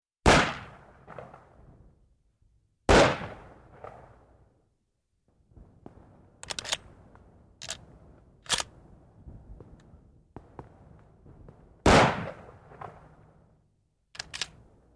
Single-shot Firearm

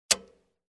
Switch On

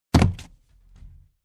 Pile of boxes being arranged

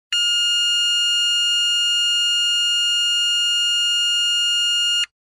Warning tone steady

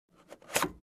Airplane Seat Closed

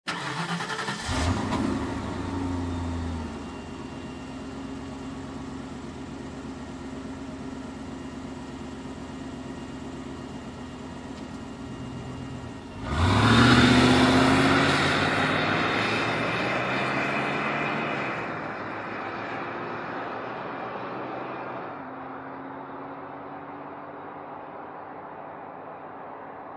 Car engine ignition and fast drive off

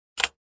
Computer Keyboard key press fast

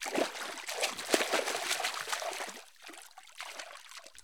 Water Splashes Soft

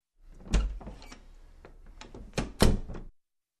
Wooden Door open close

